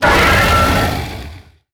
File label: boss Hit Heavy; netent